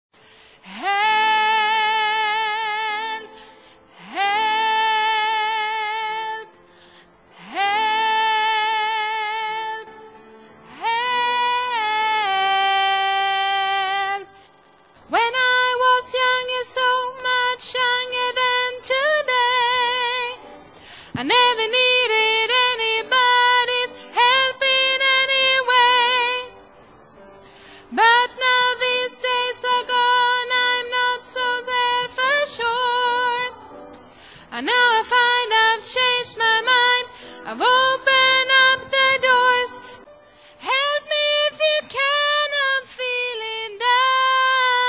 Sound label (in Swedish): sopran